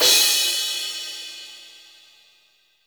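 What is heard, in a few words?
cymbal crash; Best Service Gigapack 2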